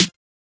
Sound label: Snare (Alternative); drumkits; Snares; fl studio; young kico drumkit